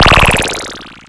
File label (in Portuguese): laser disparo